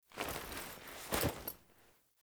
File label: Foley Action Wolf Unmount, Clothing; Characters; Player